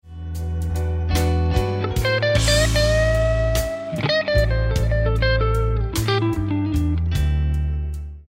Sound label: Lick, guitar